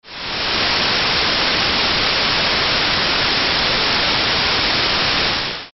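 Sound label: static sample; White Noise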